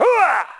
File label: Hoowah